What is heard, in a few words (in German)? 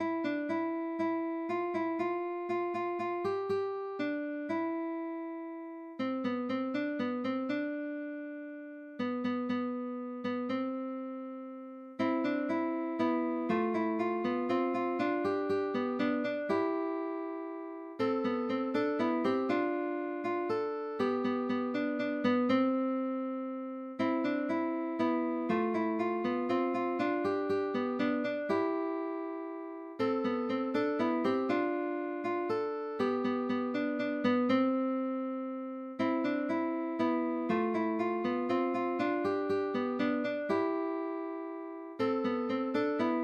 Midi Kanon Version Sonnenblume; Der Dideldaus